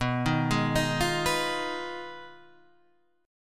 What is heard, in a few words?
B 6th Flat 5th B down Guitar DADGAD